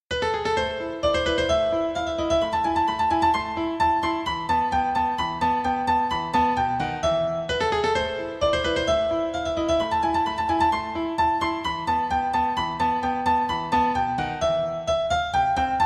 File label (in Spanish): marcha turka, Piano; Clasicos